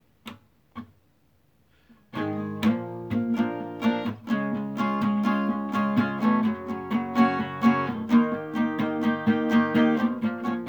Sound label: music acoustic; texas radiator and muffler